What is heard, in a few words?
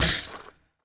Street Ball Glass Basket Chain; SFX Kit; Street Basketball